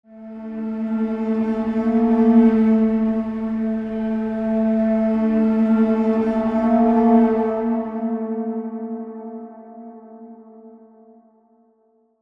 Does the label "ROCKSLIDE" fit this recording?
No